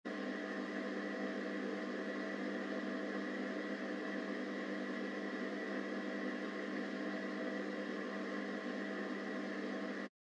Radio Signal Noise Far AM

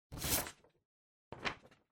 Printer Paper

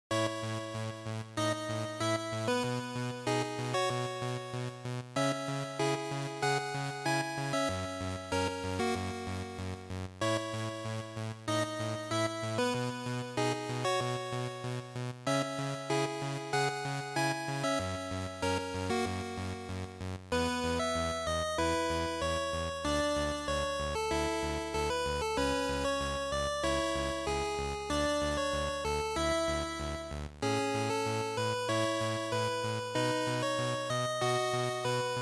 GB Shooter

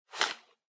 Handheld Transceiver Belt Clip Attach

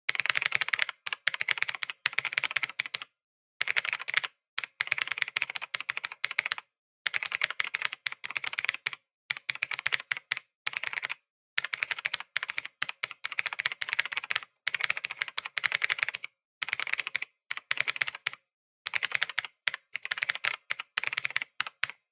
PC-Keyboard (Hard)

